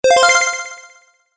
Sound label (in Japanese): 決定 高音; モノラル